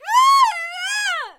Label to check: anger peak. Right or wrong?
Right